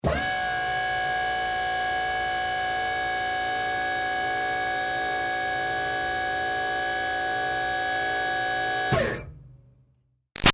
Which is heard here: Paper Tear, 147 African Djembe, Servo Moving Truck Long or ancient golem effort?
Servo Moving Truck Long